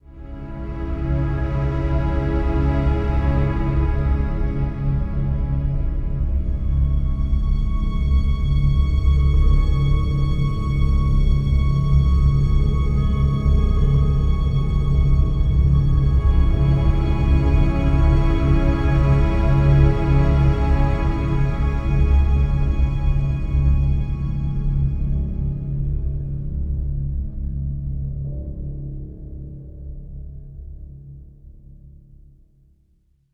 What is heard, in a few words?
Cello Backing; Title Cards Ep03